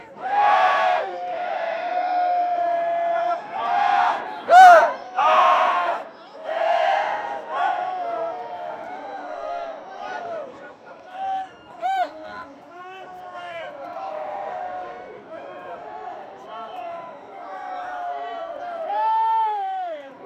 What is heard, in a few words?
outside an embassy a fran, exterior